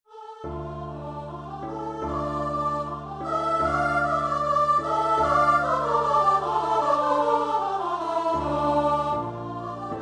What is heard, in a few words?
voice duet